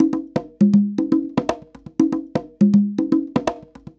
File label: Congas 120 bpm, African Drums; Quazibeat; African Secret